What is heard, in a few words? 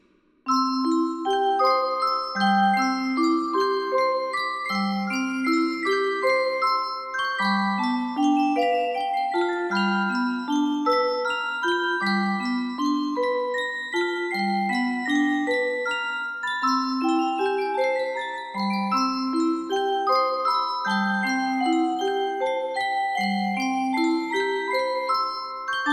carillon